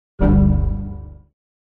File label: error